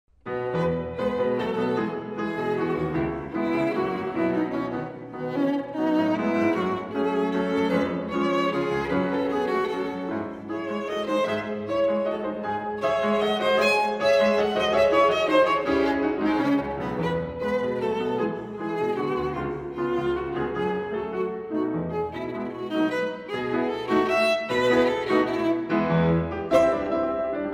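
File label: violin sonata; franck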